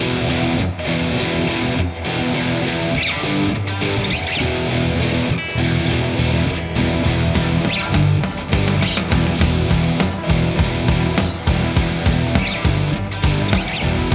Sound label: Heavy Blues NO GUITAR SOLO; Heavy Blues NO GUITAR SOLO FINAL